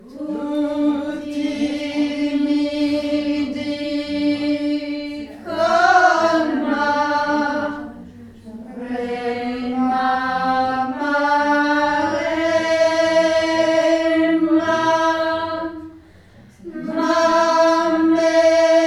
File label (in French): Maremma sopranes; St Jean